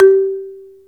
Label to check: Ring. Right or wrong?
Wrong